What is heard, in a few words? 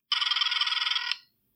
dial